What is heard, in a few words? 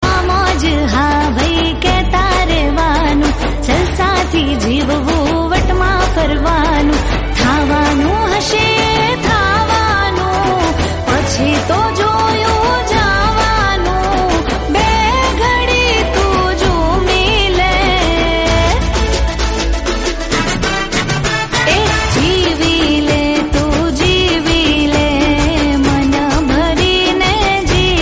Gujarati Song